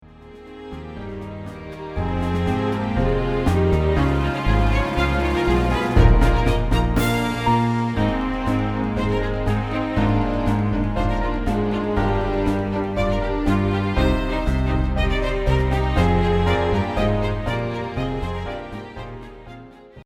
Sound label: Instrumental